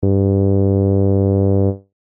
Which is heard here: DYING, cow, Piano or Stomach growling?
cow